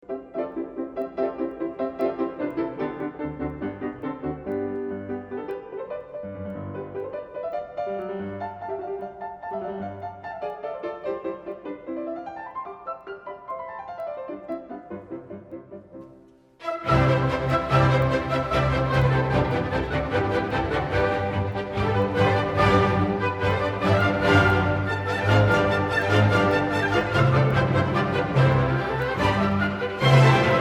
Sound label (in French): concerto piano orchestre; radiofrance; concerto n1; beethoven